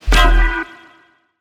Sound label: Collectibles Sci Fi FX; Video Game; Cymatics; Video Game Sample Pack Vol 1